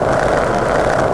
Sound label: Skateboardkid Move; Playable Tutorial Level; TR1